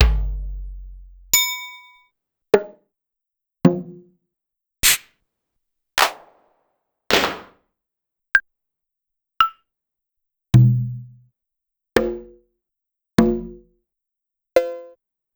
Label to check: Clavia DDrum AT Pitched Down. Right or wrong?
Right